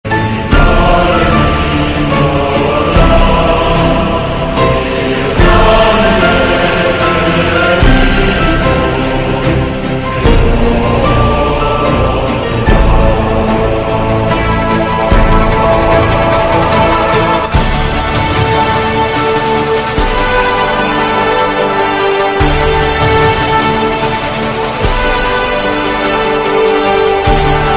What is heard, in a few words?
Cricket Motivational Bgm Ringtone; Icc